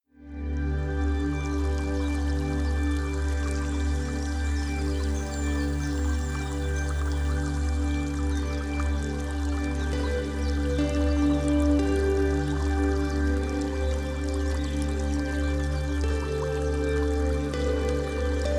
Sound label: Heavy Rain Sounds